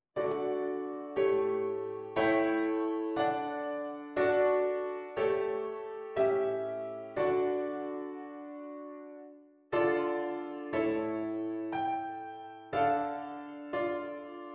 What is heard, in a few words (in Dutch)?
V en I V7 omlegging